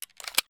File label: inv attach addon; STALKER; IWP